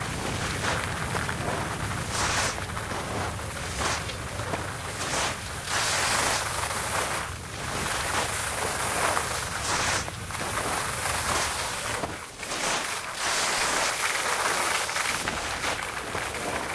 skiing; Secret Agent

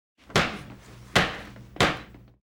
gavel; Film Noir; SoundPad

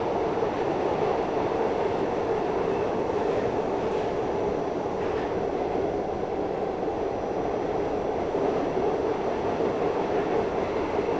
on subway loop; Film Noir; SoundPad

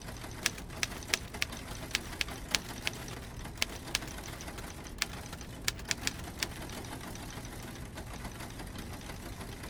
office amb loop; Film Noir; SoundPad

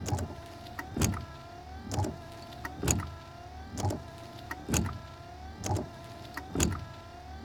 windshield wipers loop; Film Noir; SoundPad

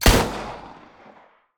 pistol shot; Film Noir; SoundPad

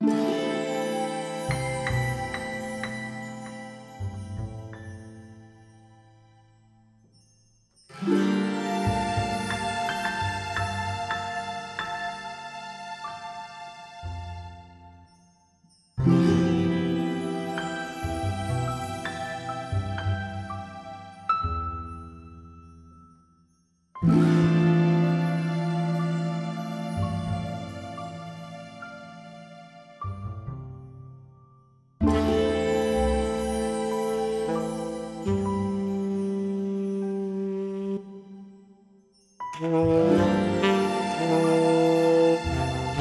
Film Noir music dark city loop

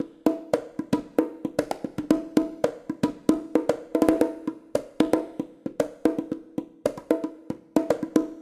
bongo tone; Secret Agent

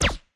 silencer; Secret Agent

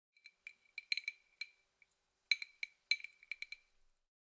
ice in glass; Film Noir; SoundPad

